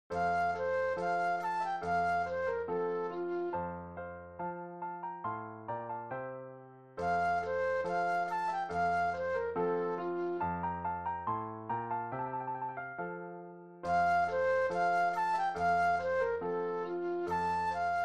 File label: March Flute Solo; classical; Clarke; King Williams